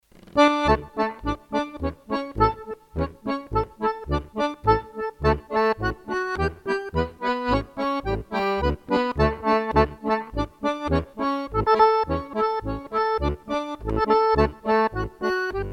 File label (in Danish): Polka